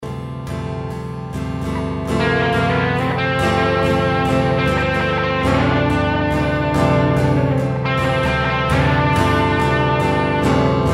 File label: jazz